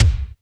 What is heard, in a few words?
Power Kick; Korg NS5R